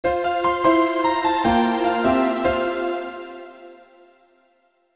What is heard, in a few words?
game clear cute